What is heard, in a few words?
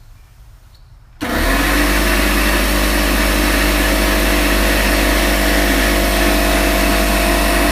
Old Compressor